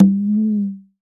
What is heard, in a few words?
L CONGA, CONGA